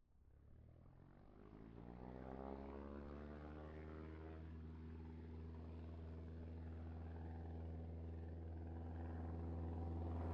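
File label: Cessna 172 EXTERIOR TAKE OFF Up By Take Off Away; Skyhawk 1979; Pole Position Production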